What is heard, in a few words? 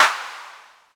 roomy hand clap sample f key; single hits one shots